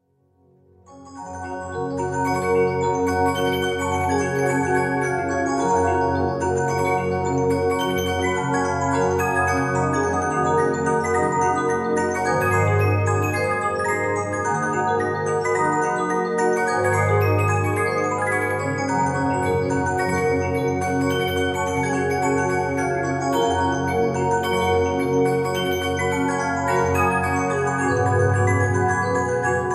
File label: music box